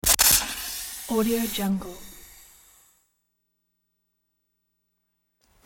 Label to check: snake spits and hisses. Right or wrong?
Right